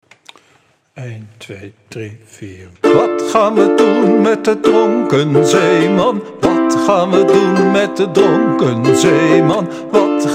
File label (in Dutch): Dronken zeeman Dm